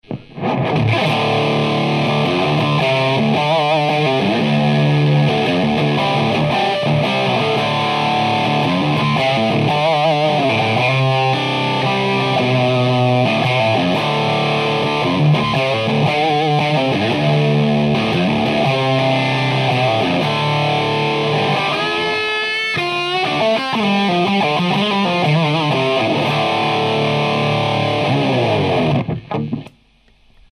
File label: lead